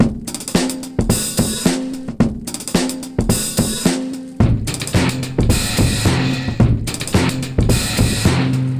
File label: HIT THE BREAK, 109 bpm; POTBreaks